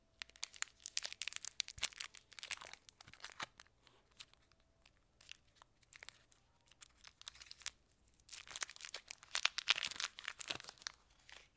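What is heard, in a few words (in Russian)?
звук разворачивания мини шоколадного батончика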